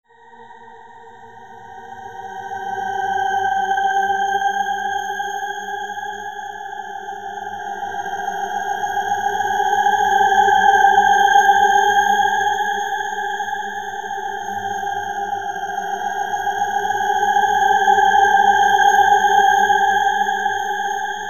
MUSICAL

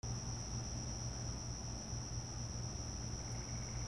ANIMALS

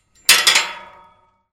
DOORS

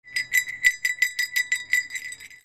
BELLS

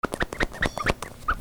TOYS

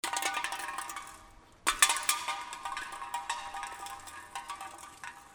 FALLING

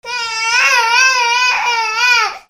PEOPLE

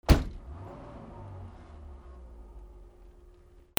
CARS

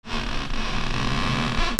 SQUEAKS

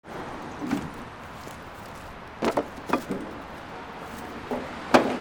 FOOTFALL

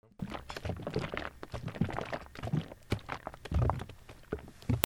HOUSE

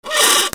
FRICTION

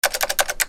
OFFICE

